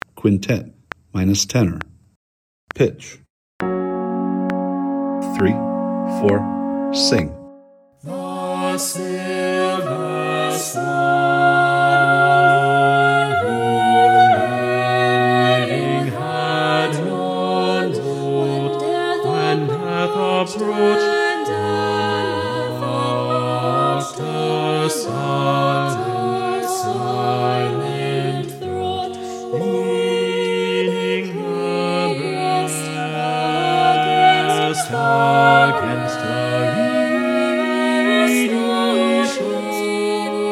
quintet tenor II; all state choir

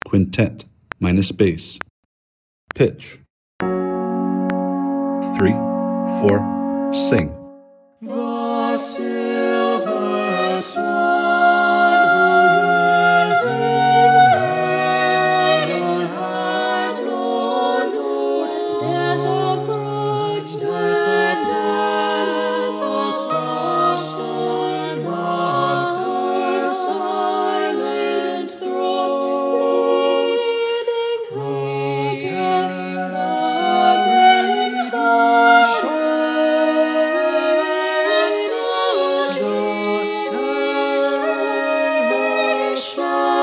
quintet bass; all state choir

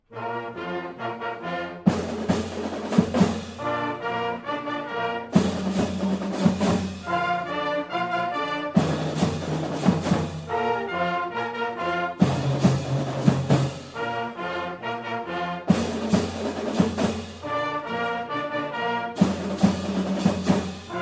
Fanfare, marching band; warmups; hs marching band stands music and warmups

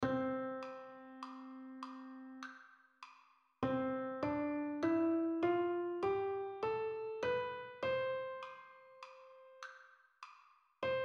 diatonic scale sop low; sop 2; HIGH SCHOOL CHOIRS; diatonic scale 2016; REGION I

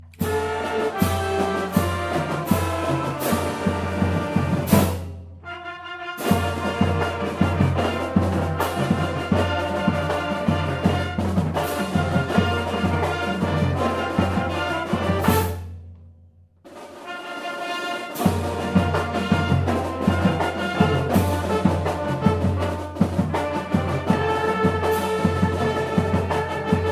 slower version, marching band; hs marching band stands music and warmups

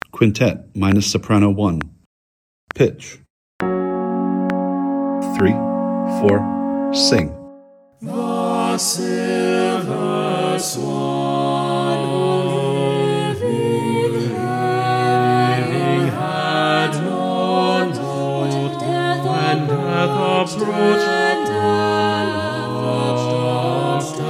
quintet soprano; all state choir

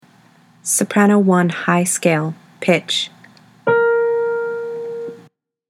soprano scales high; all-state choir

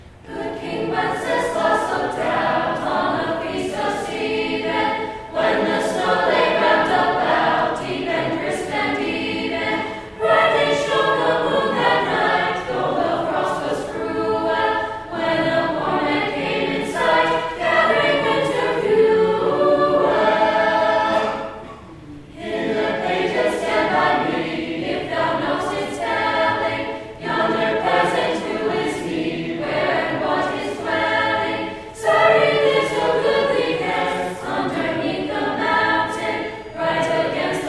Good King Wenceslas Vocal Ensemble; 2017 small ensembles holiday performance

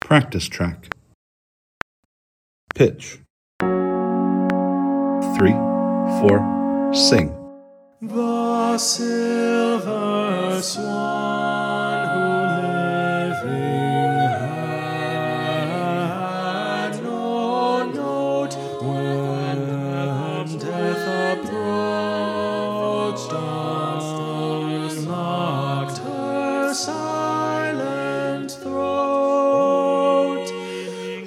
quintet silver Swan Tenor Practice Track; all-state choir

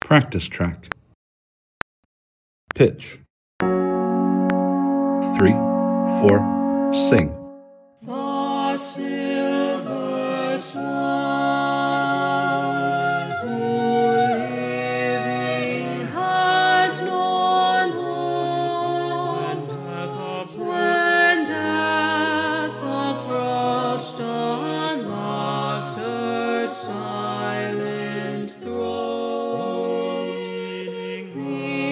quintet silver Swan Alto Practice Track; all-state choir

